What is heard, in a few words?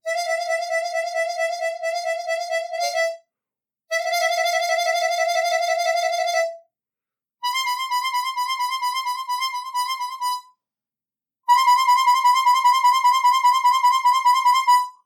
Diaphragm and Cheek Trill, harp